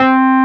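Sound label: WURLY NO, Wurlitzer electric piano; Sonic Reality; Vintage Timetraveler; WURLITZ EP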